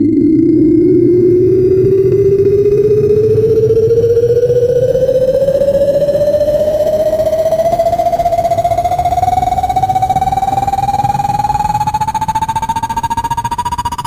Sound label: short high pit, abstract